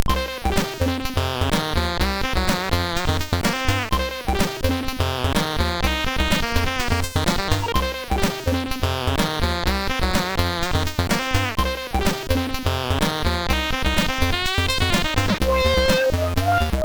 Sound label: guitaring, SIDTunes